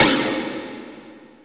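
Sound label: AMB snare drum; WORS STATION; AMBI BLEEP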